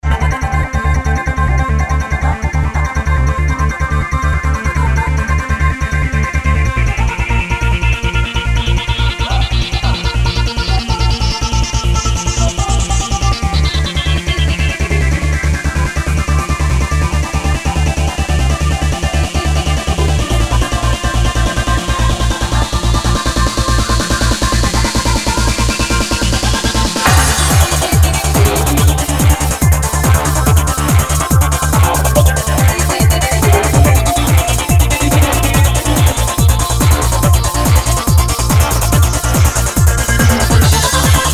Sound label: indoor, progressive trance